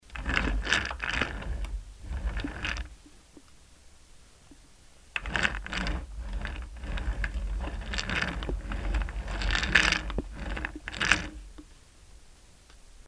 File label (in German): murmeln ueber tische rollen